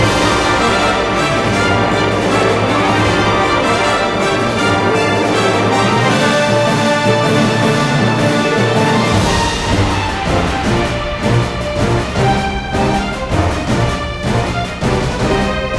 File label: Dramatic loop; PeriTune Material